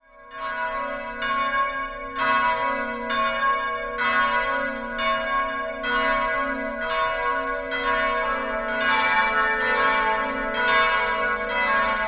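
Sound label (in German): durchschnittliches geläute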